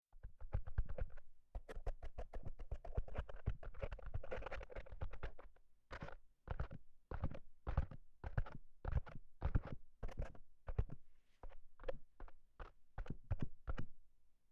タッピング （声なし）; 眠くなる音

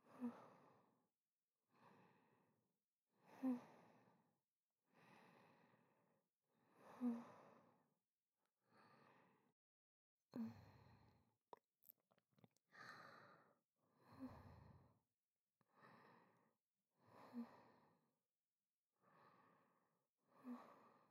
寝息ループ（右）, ASMR

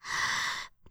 ブレス ロング

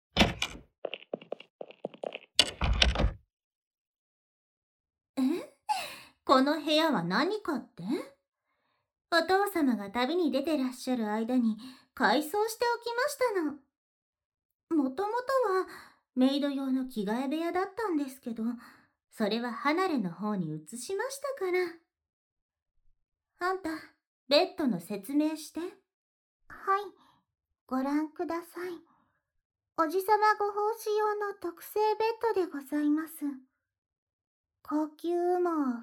耳かき

